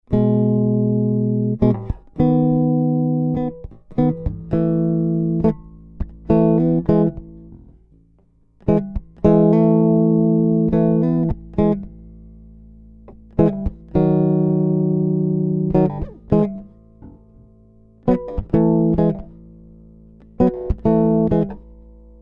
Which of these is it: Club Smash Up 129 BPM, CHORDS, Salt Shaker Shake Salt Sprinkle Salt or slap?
CHORDS